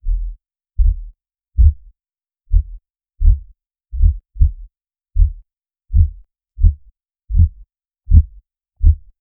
muffled dull fleshy thud

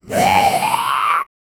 a raspy menacing snarl, zombie

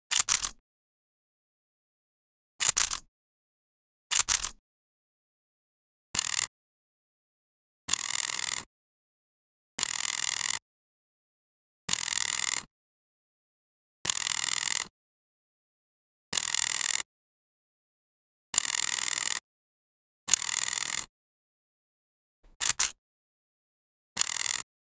camera shutter sound

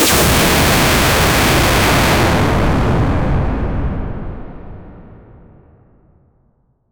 a jumpscare sound that is, monster

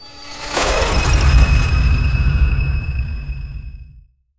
cartoon fantasy ice nova

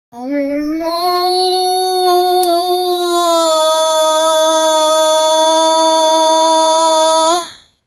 very large crowd dozens, chant

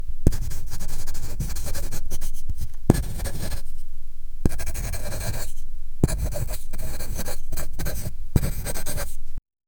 drawing a line with a, classroom

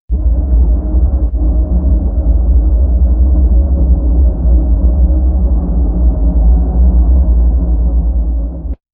sad sound; emotions

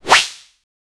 jrpg game sword swoosh; attack

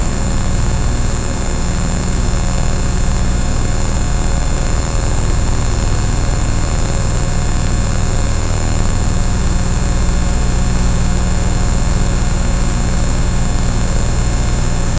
low frequency, electrical; create a low frequency bu

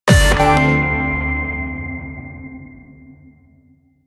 juicy hit sound laster re

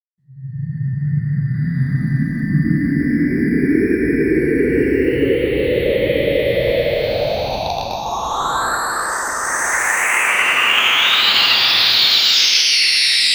cosmos sound

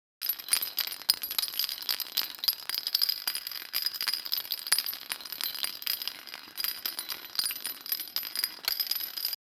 craft ui sounds; assemble

